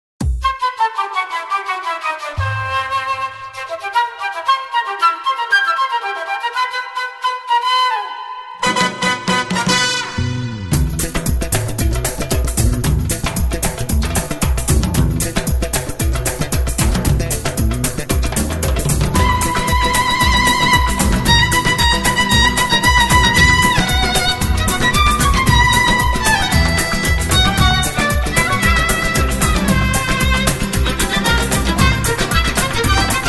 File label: Instrumental